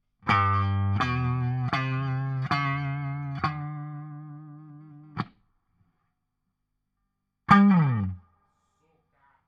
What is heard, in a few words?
Guit Solo Back